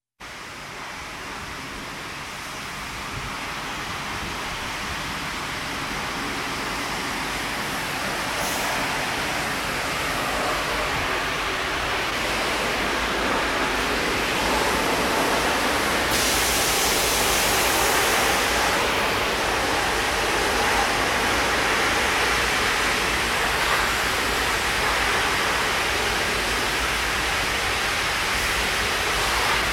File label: Reisenrad Ferris Wheel Sandblasting